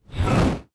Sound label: technician attack; propack